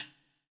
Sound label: POWER RIM